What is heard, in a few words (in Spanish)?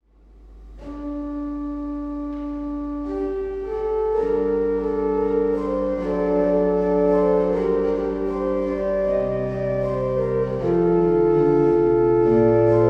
flauta armónica, órgano